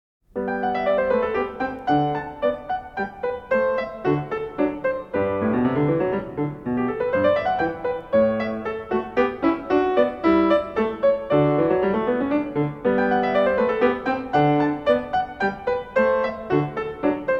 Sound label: Prelude In G Major; Magrath Book 06